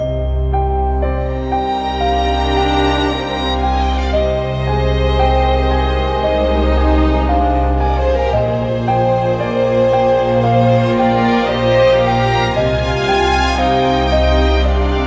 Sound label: Sad Theme Loop; Gran Saga